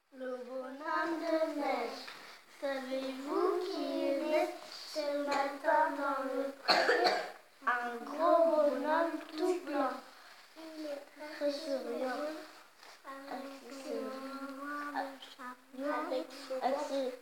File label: Vocal